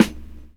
sd Vinylist; Computer Music Aug 01; E-Lab; e-LAB Demo Samples

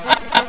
crazy

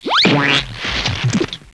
Squish; Princess and the Pea; SoftKey

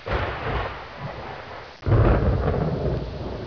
thunder; 12000 Graphics Pack - A Complete Graphics Collection For Your PC

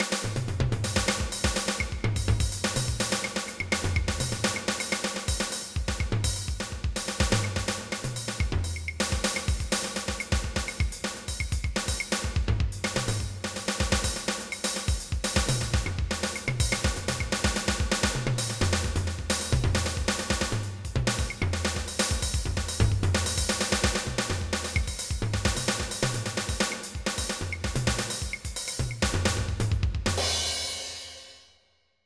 drum solo

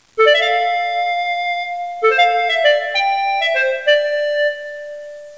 clarinet; music

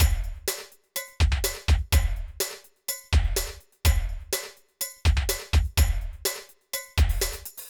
Funky; syntonic generator

